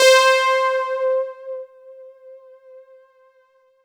Roland JV 2080, interval; MG INTERVAL

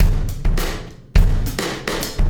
Wormhole Beat, Processed Drumkits

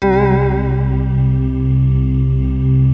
WOOD WORDS; Rubber Chicken Software Sample Bank

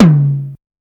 Tom, dx

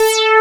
Hybrid Bass A4; Digital Sound Factory Xtreme Lead

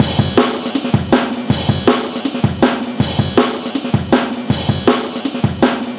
160 Lo-mo Drum & Ba

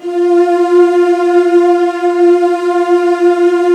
F1, Virtuoso Strings

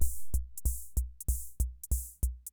Ace Tone Rhythm Ace Loop, Drum Machines; 1967

